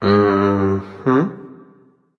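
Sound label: non verbal agree, voice; cernunnos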